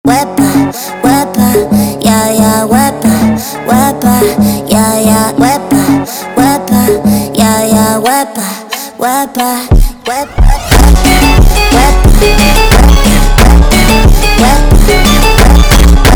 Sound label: Funk